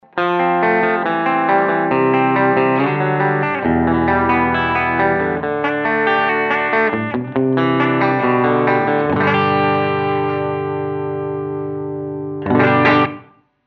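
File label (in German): Two Notes Le Crunch kanal a strat steg pickup wall of sound iii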